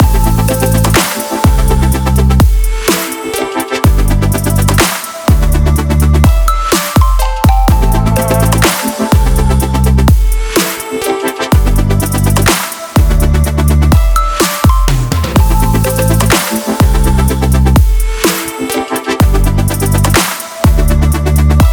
future bass loop; fashion future bass loop

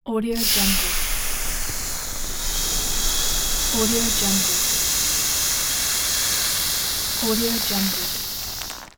ball deflate

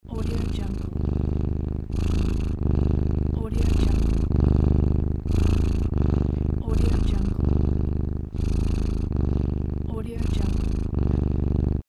cat purring while sleeping

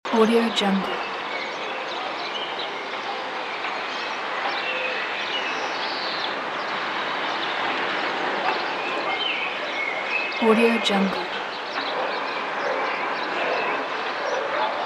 ambient suburban area; carcasound

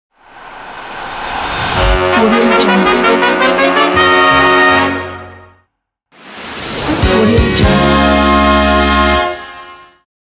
big band jazz coda

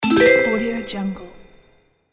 fuzzy confirm button

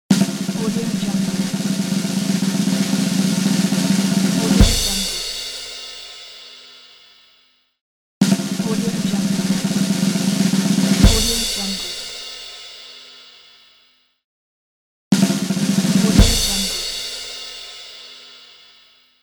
drums roll

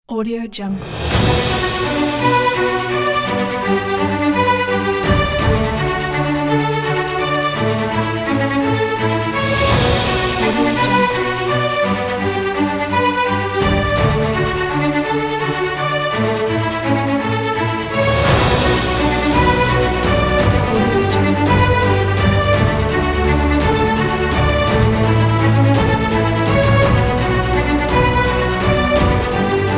epic heroic orchestra

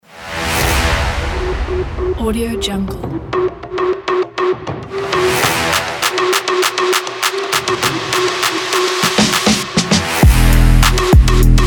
trap action sport

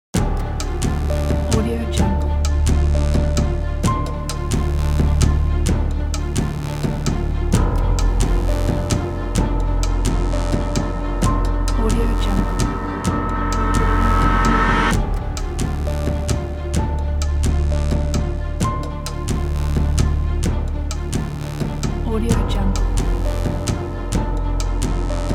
waiting for battle

